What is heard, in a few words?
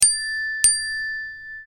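sms received, iphone os sounds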